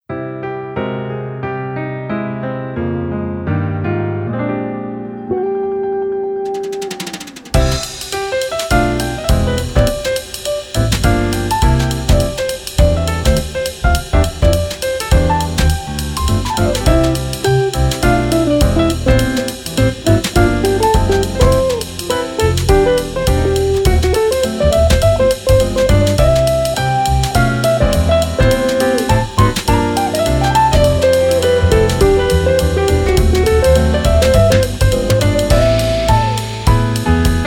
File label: jazz